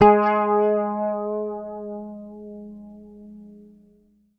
G#, Bass; Sampleheads; Will Lee Bass Library

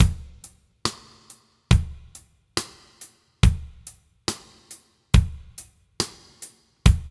DRUMS; Funky Ass Loops; East West

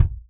Real Drum Kit, ROCK KIT

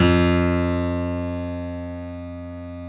GRAND PF; B-GRAND PF

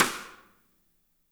MED SNR; ROCKER KIT

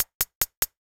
hihat, Hardcore Breakbeat, 145 Ebmin; Big Fish Audio; Hardcore Breakbeat Action; Riddim

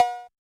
Yamaha DJX 2B, T8 Cowbell

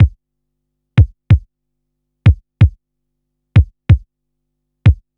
BEAT, Roots Funk; USB Soundscan vol.41; AKAI

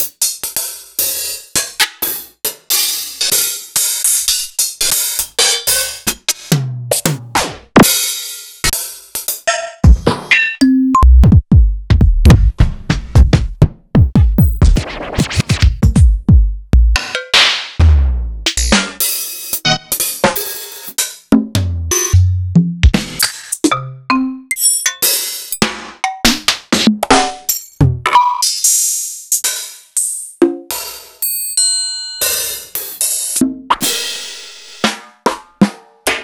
EMU Proteus-2000

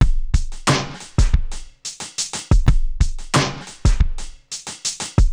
Street Tip, R&B Drums; Sonic Foundry (Sony Creative Software)

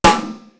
BD AND RIM; East West; Global Sounds

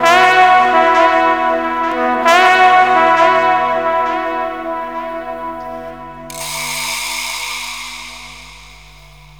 TROMBON FX; USB Soundscan vol.27

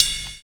SNAP; Univers Sons - Kitch 70s

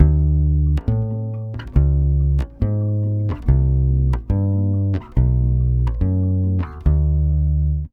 BASS GROOVE, Brazil Bossa; USB Soundscan vol.16

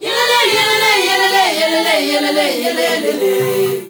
ZULU CHR, FEM. CHOIR; Spectrasonics; Heart of Africa 2

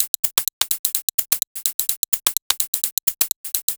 Hihat 127bpm, House Drumloops

